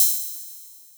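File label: hi-hat open, Vermona DRM2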